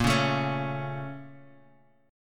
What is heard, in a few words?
Bb Minor Major 7th, Guitar, Half Step Down